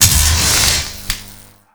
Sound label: Alt Orb Zap; Weapons; Warframe; Tenno; Grimoire